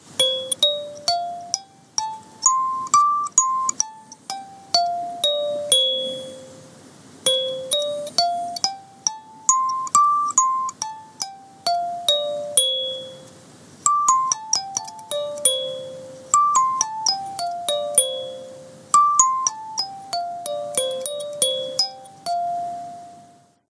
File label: kalimba k7 a2; mini